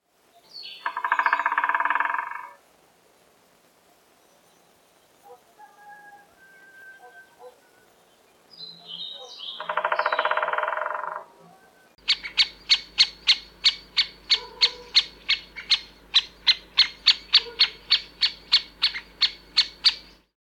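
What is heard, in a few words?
great spotted woodpecker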